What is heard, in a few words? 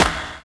HORROR; Univers Sons - Kitch 70s